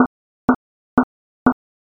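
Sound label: footsteps slipper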